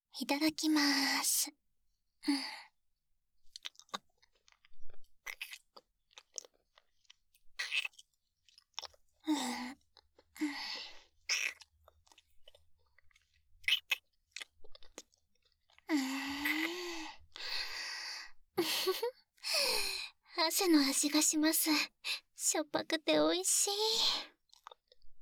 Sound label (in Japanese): 乳首舐め, ASMR; アドリブ集